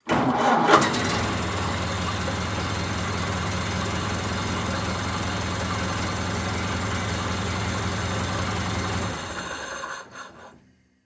Car Ford Model A Start Idle Off Mic In Front; transportation

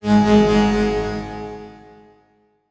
Transition Tremolo; Production Element

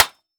Sword Hit Solid Metal, weapons; AdobeCC

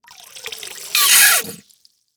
Foley Water Toy Empty Squeeze

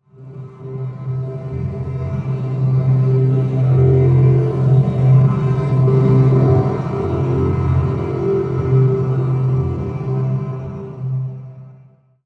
Transition Stab Dark Musical Tonal; Production Element

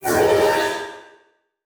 Transition Growls Vocal; Adobe; Production Element

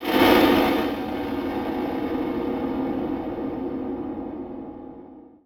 Title Transition Stab Dark Short; Production Element

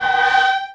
Transition Feedback Ring; Production Element

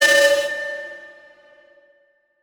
Transition Feedback Warble; Adobe; Production Element

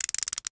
Industry Ratchet Clicking

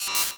Sci-Fi Electronic Robot Droid; Science Fiction

